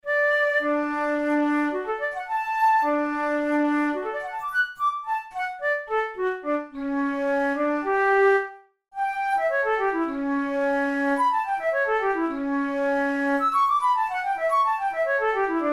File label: studies for the boehm flute; drouet 72